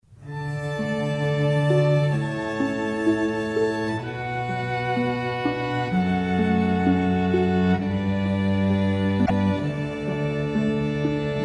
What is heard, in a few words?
String Quartet canon; pachelbel